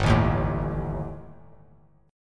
G-Suspended 2nd Sharp 5th-G; Gsus2#5 strummed; JGuitar

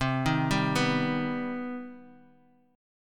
C Minor Major 7th C Guitar 4F,4A,5C,5G,5C,6E; arpeggiated; CmM7

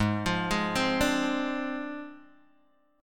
G sharp 6th Flat 5th G sharp Guitar DADGAD

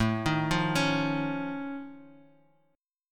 F Augmented 7th A, chord

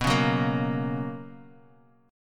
B Major 7th Suspended 2nd Bass Standard, chord; BM7sus2; strummed; JGuitar

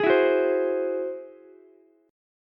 G sharp Augmented 7th G sharp Ukulele; G#+7 strummed; JGuitar

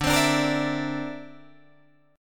D 7th Suspended 2nd Sharp 5th, Guitar Drop D

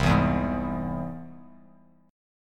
C Augmented down Bass Standard; strummed; JGuitar

